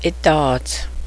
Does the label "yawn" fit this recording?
Yes